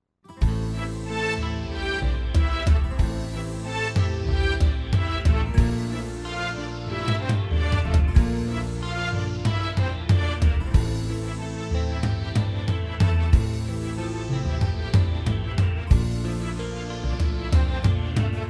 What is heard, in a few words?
POP SLOW; usb turk demo